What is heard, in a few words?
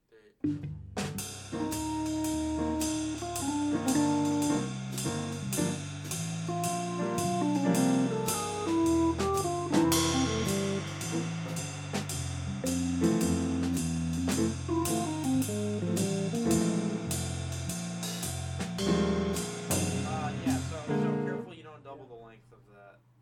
jazz Solos